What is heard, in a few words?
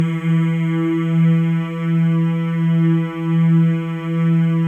MALE MMS, Symphony of Voices; Spectrasonics